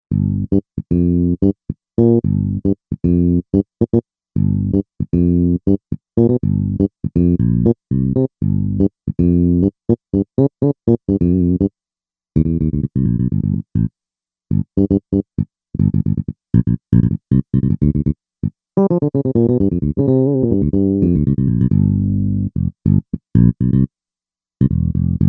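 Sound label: Stanley, synth bass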